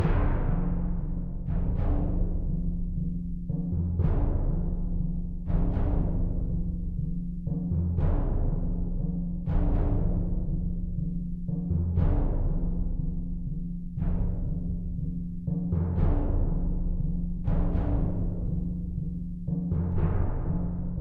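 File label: volcano enemy fight; Stonewin Volcano Emeny Fight 1 Stem 2; Beast Quest; PS4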